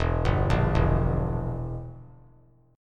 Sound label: E Diminished down; Edim arpeggiated; JGuitar